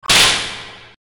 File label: Collision; Rally Stars 3D